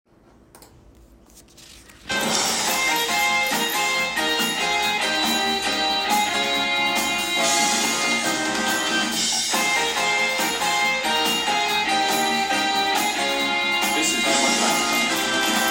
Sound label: Soprano; Fame The Musical - Holiday Pack